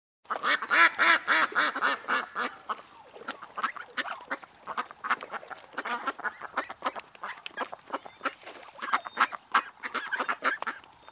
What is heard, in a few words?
Flock of Ducks